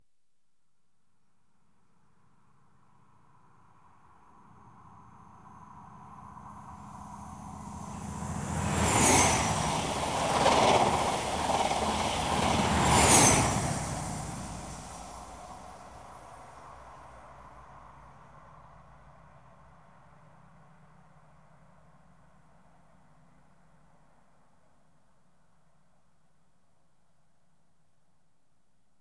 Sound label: Trains Passing Inter City 125; BBC Sound Effects Library